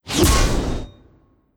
Archmage Attack

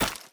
Projectile Break

